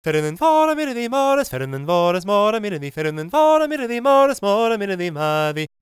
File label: GAELIC, World Voices; ILIO; Vocal Planet